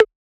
Perc, Percussion; FLPRO

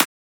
Transient; FLPRO

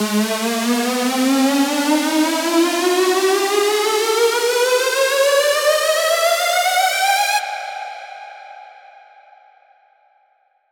Square Ab 4 Bars

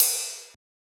Ride; FLPRO

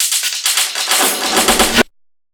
EDM Drum Fill; FL PRO

